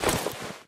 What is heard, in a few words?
adrenaline deploy; weapons; Left 4 Dead 2